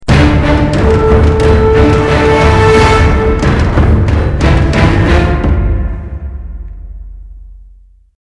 game start; sven

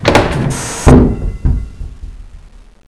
button

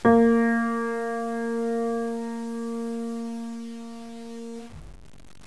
piano b2

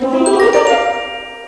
game bonus

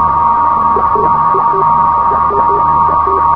signal gear, raccoon

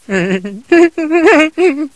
hihi

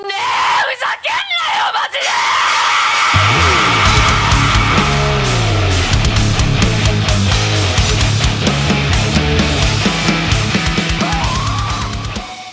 metal; chatsounds; rushia

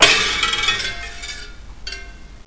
bust glass, debris

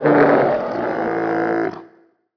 alert; unreal; titan

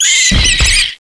bat die; eqclassic

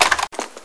mp5 clip out; sci arms

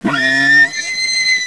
pit drone hunt; betapitdrone

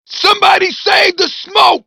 death, grunt

